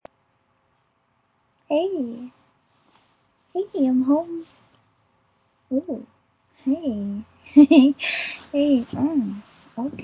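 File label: [F4M] [lady noises][I get loud]; Welcome Home; [warning I get loud]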